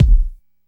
long room reverb steel kick drum C key, kick tuned to the C note